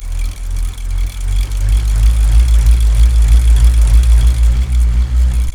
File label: BULLROARER, Primitive Instruments